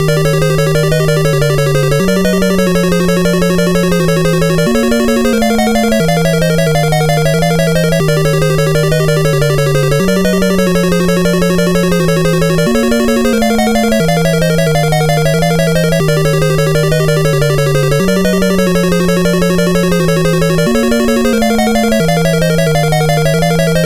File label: BGM Castle Play; Super Mario Maker